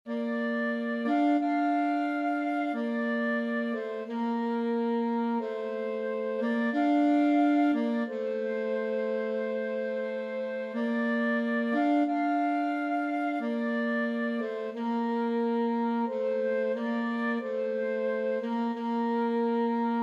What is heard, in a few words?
largo, flute saxophone duet; dvorak